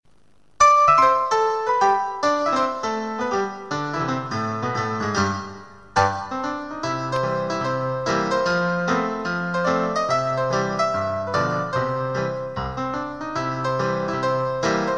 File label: Ragtime Piano